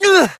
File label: Generic Short Pain Operator Voice